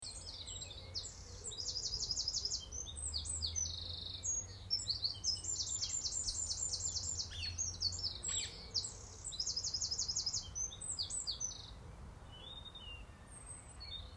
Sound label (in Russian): penie ptic dlia fona